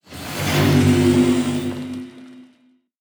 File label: Ui Build complete